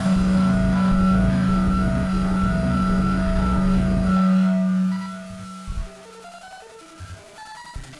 Battered Synth; malfunctioning synth samples

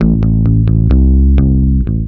bass, Roots Funk; USB Soundscan vol.41; AKAI

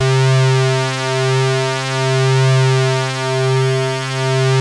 BROOMSTICK; Masterbits; Vintage Voltage

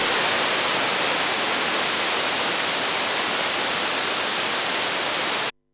Yamaha CS5 Raw, white noise; noise hiss crackle

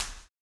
STOMPS; Spectrasonics; Vocal Planet; Blues Soul

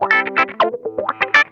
WASPISH, Funk Guitar; Zero G - Funk Guitar

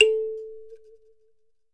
THUMB PNO, African Melodic; E-MU Producer Series Vol. 7 – Old World Instruments

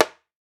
WALK DRUM, African Drums; E-MU Formula 4000 Series Vol. 4; Earth Tones

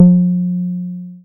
BAS SH-101; Best Service Gigapack 2

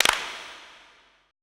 CLAPS

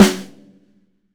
LUDWIG SNARE

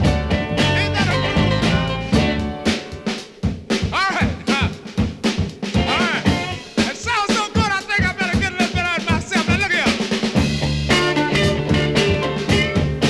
breaks, breakcore

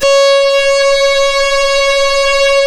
C#, strings; Big Fish Audio

